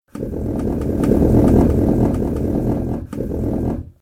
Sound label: chair